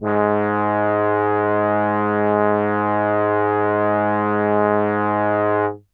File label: tb g#1, MIRAGE Trombones + Trumpet; Retro Sampler